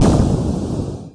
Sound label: boss hit; VIDEO GAMES